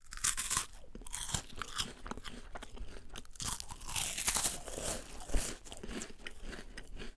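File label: human eating wafer biscuit crunching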